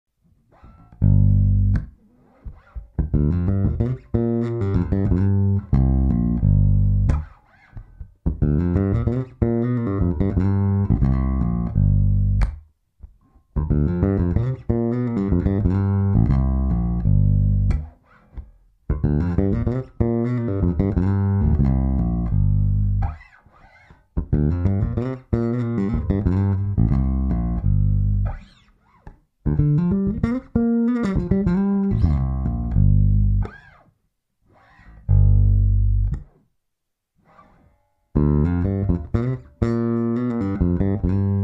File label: G bluesy bass lick